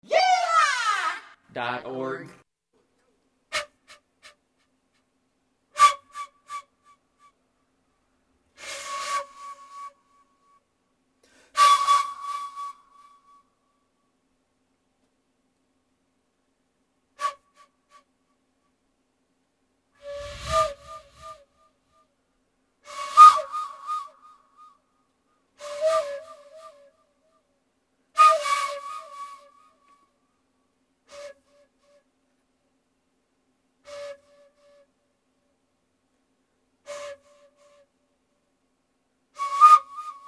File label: flute, extemporaneous concerts